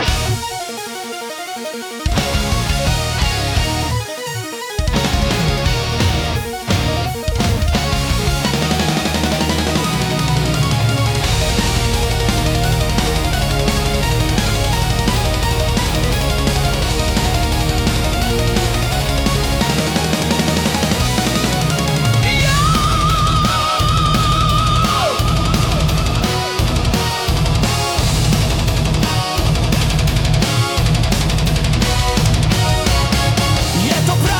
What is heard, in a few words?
Metal